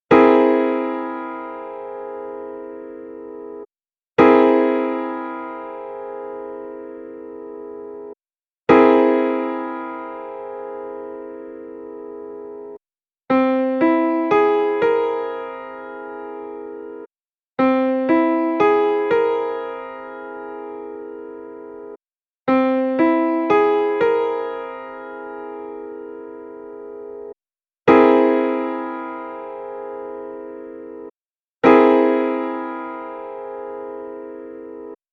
C75 Piano Chord

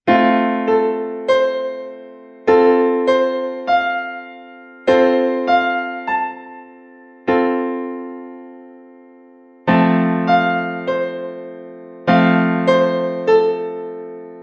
F Piano Keyboard Chord

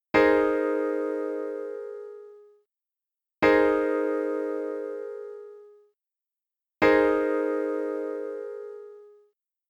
Eb6sus4 Piano Chord

